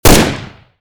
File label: rpd fire; STALKER